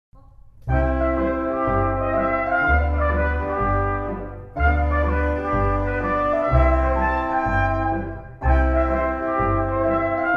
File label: Skip less Drums